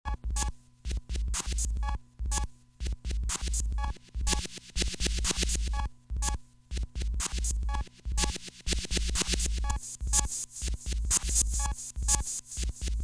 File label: drumssette backwards